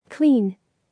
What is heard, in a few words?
clean